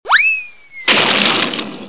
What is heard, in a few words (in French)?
Tomber, Cartoon; bruitage